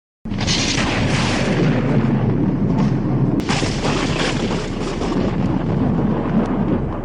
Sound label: horror lightning stike